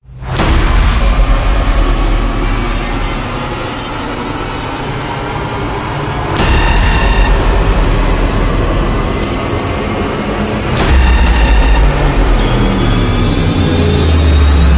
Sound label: Blaring; Ninja Tracks